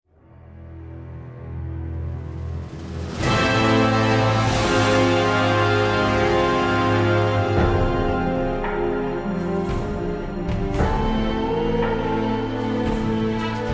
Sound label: intro ship; hero of sparta; iphone